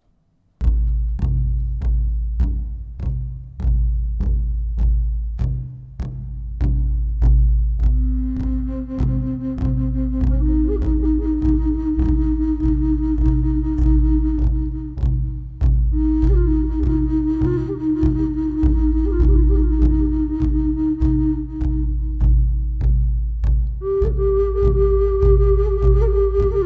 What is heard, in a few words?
Quiet Reflections